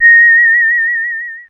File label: Synclav FM, WIND, A#; Big Fish Audio